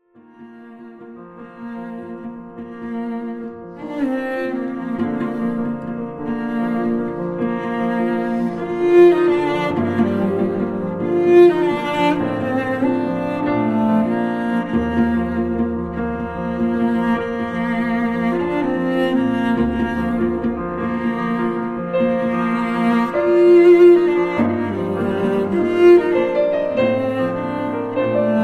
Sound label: background music, Wedding Invitation